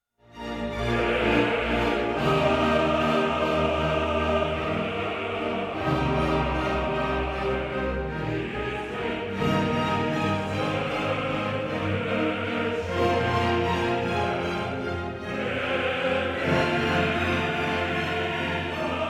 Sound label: opera